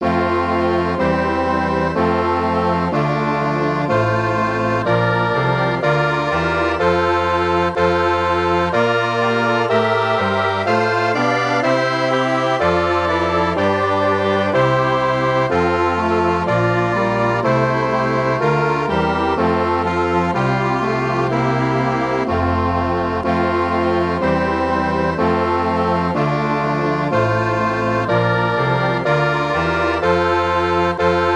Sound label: MIDI